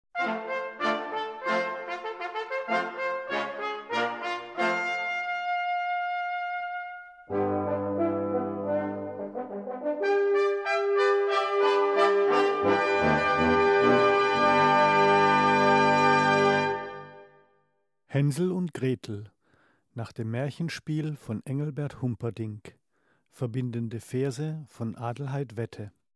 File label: Fanfare, brass